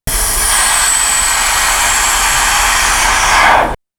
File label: vacuum brakes applied; castle class